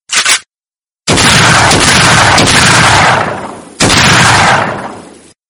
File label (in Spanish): disparos de escopeta fuertes